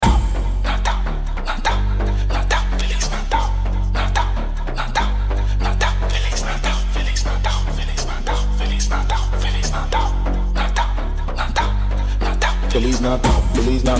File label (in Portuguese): sped up